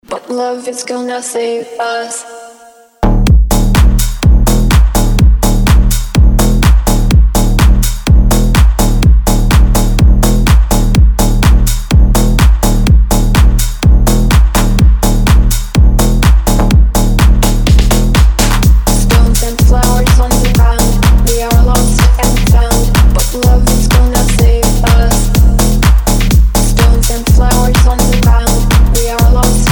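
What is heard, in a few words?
BASS CLUB car audio loud front; Gromkij front 215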